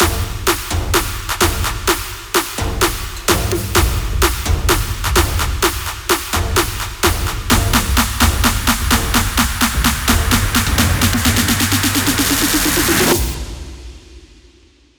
128bpm long fill

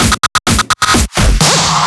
synth drum fill; fm

